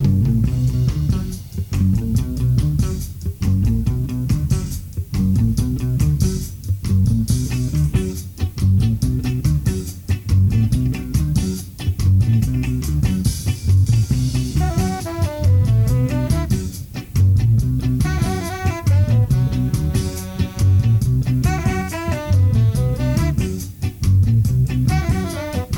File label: Ska